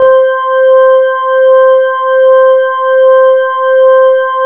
C5, ORGANS; House Side Of 2 Step; USB Soundscan vol.51; AKAI

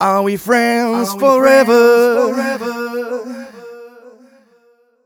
130 mel g, Vocals

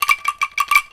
D5 ANKLUNG, JAVA ANKLUNG; AKAI S6000 CD-ROM; WorldSounds

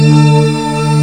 pad P5th; jazz jackrabbit 2; M8

